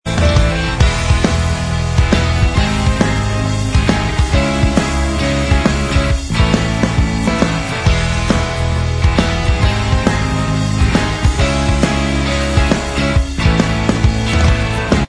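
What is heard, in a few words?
instrumental track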